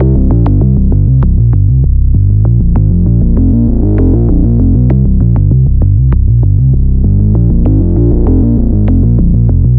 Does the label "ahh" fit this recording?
No